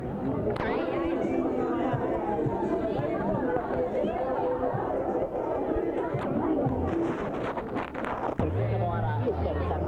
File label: party